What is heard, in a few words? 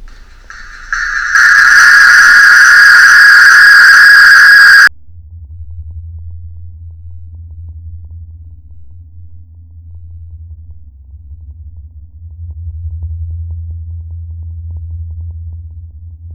Compilation of the sounds slowed and full, birds